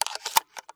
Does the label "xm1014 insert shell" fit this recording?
Yes